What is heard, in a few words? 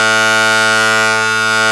SYN GUISYN; Sound & Vision - Gigapack II CD 1